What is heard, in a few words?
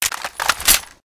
five seven clip in, weapons; kuilu